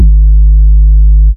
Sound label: BD, korgdrums